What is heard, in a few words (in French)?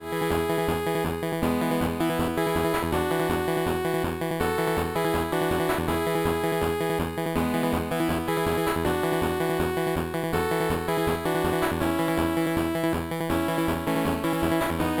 musiques theme fin